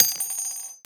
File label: Shell heavy default; STALKER; IWP